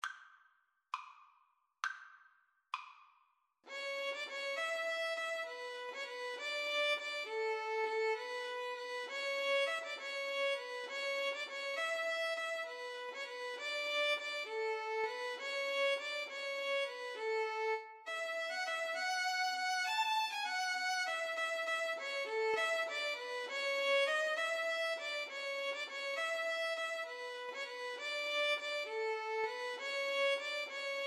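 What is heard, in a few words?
sonata violins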